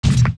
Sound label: Fall Down Ice; Link